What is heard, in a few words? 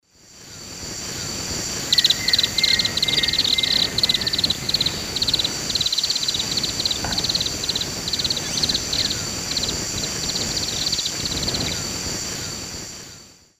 Atticora fasciata, calls; S. America; Eastern Lowlands